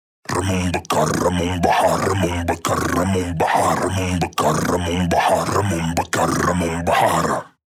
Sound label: 138 Shamanic Voice (Dry), Psytrance; Shamanic Voices